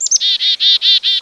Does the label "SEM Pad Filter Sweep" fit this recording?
No